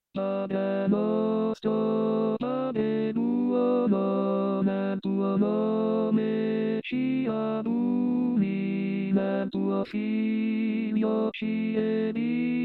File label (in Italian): Soprani